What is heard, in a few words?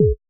kick, Roland MC-909